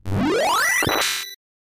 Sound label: Victory Boom; Cu-On-Pa; SNES